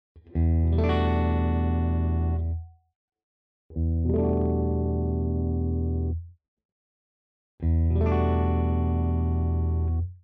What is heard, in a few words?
Ibanez AZ SDB clean tone; poti